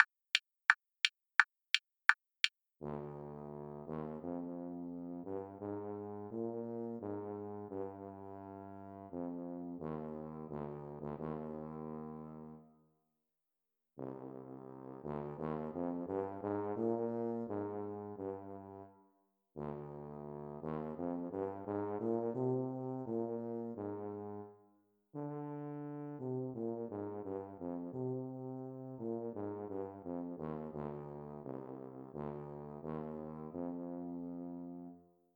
tuba slow

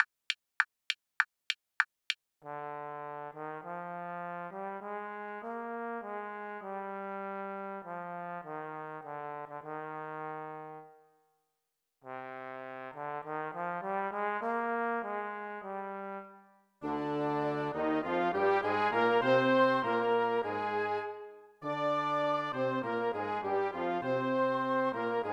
trombone fast

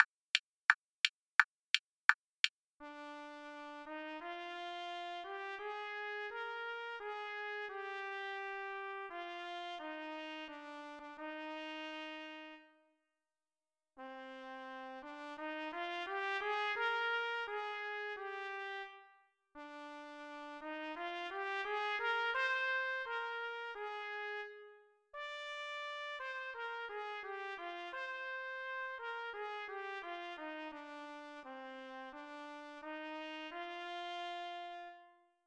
trumpet slow

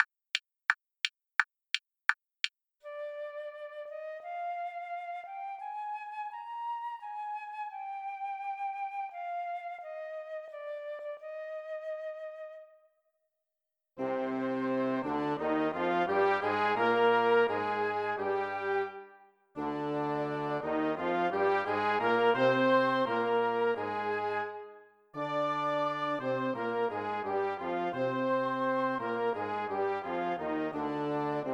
flute slow

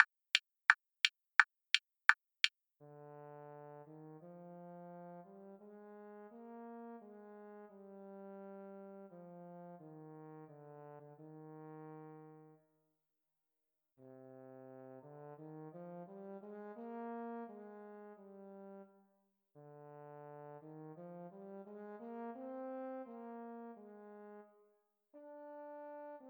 euphonium slow

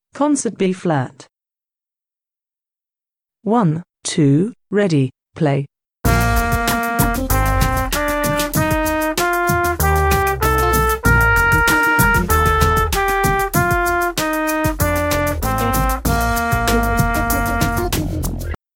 concert b♭ scale

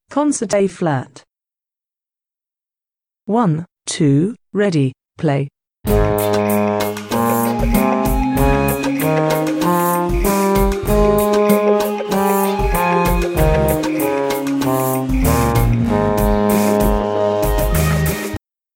concert a♭ scale

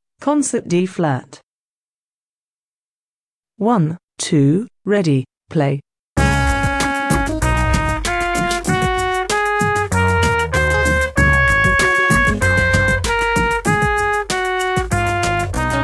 concert d♭ scale

